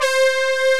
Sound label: OB brass C5, OB Xpander; Greytsounds VOL-1